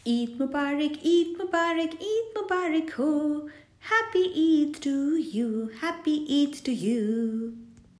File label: Eid Song